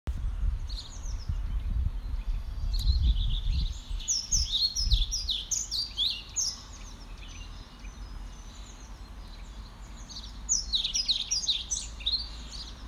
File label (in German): trauerschnapper gesang; natur erleben; stunde der gartenvogel